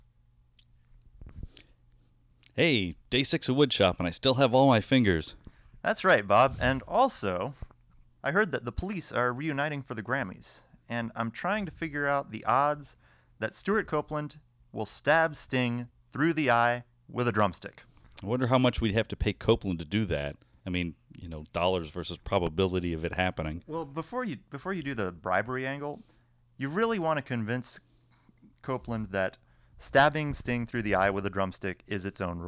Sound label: cartoon stabs sting